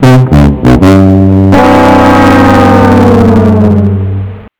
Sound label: Failure; Happy Feet Two; NDS